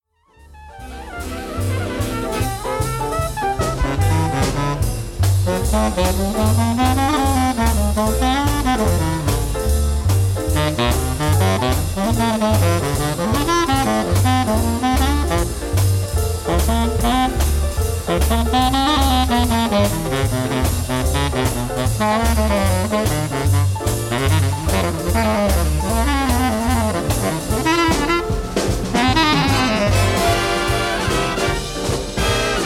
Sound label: Mellow Tone